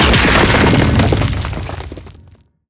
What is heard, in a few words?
impact flavor, smokestack; destruction; Half Life Alyx